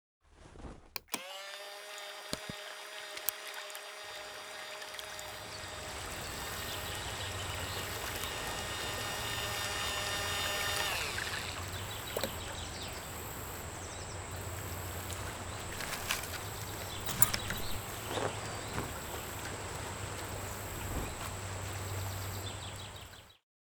Boat Comes; yesterday origins gamerip 2016 switch ps4 pc ios macos

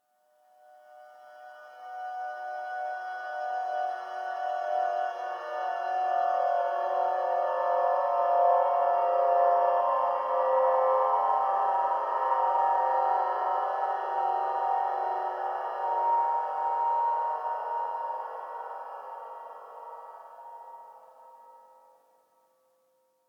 Water Girl Above Water Horror; inside

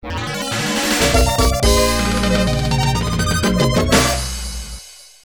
Congratulations!; Hayaoshi Quiz Ouza Ketteisen; The King of Quiz; jaleco mega system 1; arcade

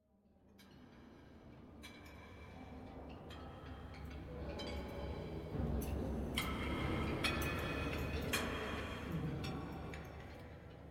Restaurant dream; The 39 Steps

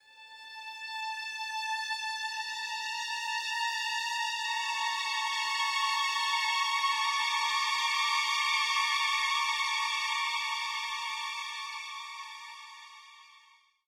String; Far Cry

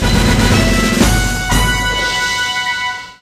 Jingle Victory Fanfare; rayman 3 hoodlum havoc gc ps2 windows xbox 2003

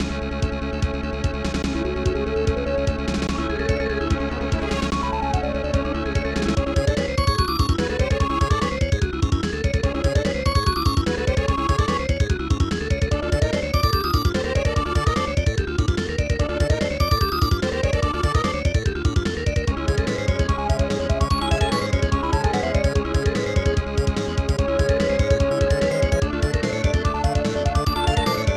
Mine Cart Ride; Go Go Ackman 2

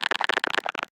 Chitter; Lethal Company

